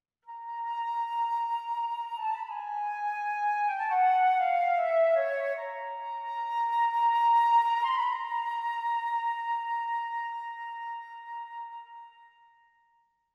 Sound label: Syrinx; Debussy